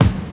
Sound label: high quality clean pop kick single hit g sharp key